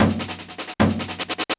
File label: LOOP, 150 BPM